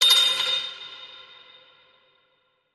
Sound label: Sting Clatter